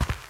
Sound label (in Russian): bistrie chastie pomehi v televizore